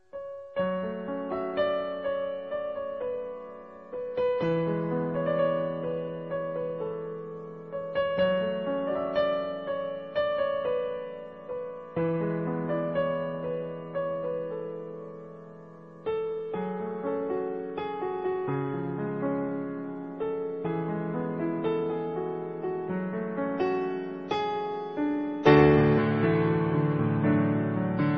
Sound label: Most Romantic